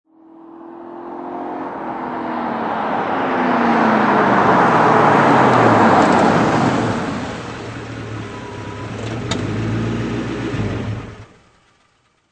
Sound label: Car Approach